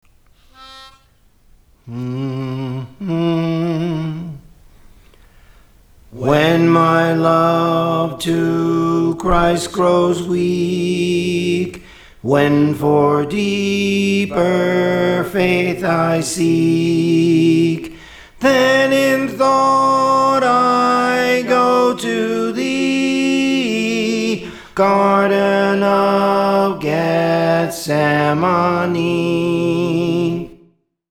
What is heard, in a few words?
Soprano; Praise For The Lord Training